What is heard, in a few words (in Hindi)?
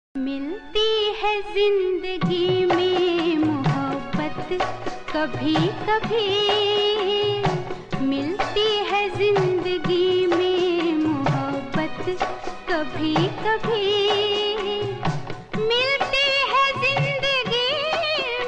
ringtone hindi song